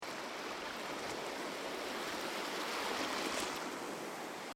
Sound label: Ocean Beach Sound Of The Sea Surging Billows; Crafted Signals